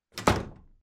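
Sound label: door slam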